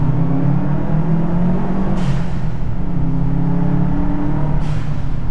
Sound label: deceptor pro ii std trumpet atmosphere in car